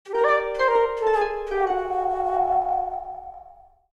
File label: Mobile game fantasy fail lose